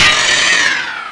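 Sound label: punched; BANZAI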